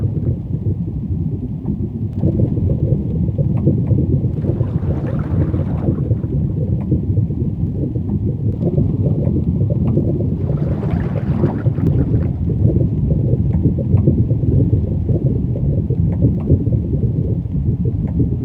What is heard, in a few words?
Castle Lava Ambience; New Super Mario Bros. Wii